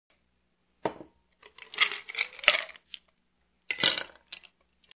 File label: Bottle Picked Up